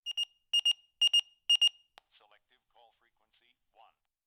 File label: beeper emergency call